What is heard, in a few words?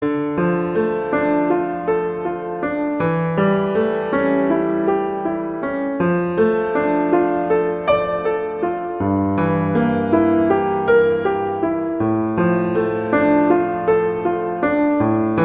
Prelude, D minor; Clementi; Preludes